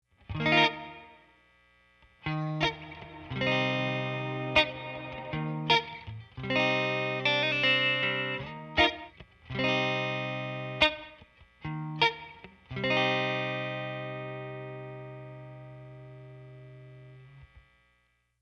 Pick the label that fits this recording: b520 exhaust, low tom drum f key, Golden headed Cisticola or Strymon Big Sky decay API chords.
Strymon Big Sky decay API chords